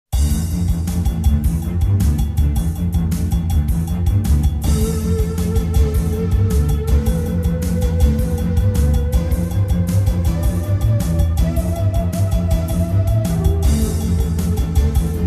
バトル; Overblood 2

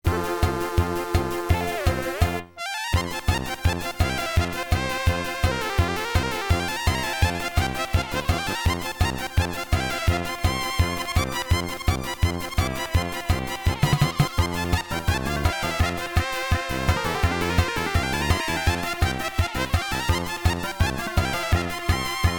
平和 FM音源版; fray msx remastered soundtracks 2008

